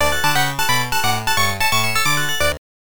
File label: Lose Miss; shuriken boy arcade gamerip 1993